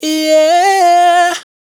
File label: riff yeah Cm, Dry Vocals; Raw Vocals; Slate Digital Omnivox Vocal Sample Pack